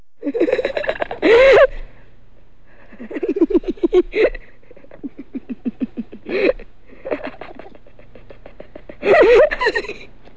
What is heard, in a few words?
child; SoftKey Entertainment Pack; SoftKey Multimedia